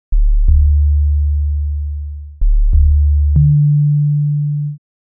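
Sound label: z3ta without filter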